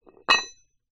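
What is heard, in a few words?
Weight Drop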